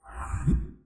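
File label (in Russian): вуш из картонной коробки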